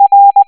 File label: r tone